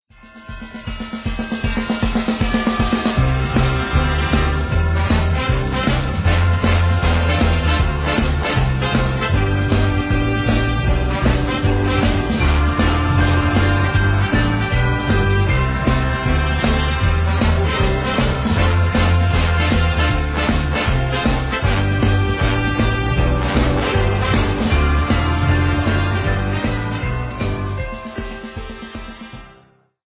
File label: Festive Razmataz; BIGS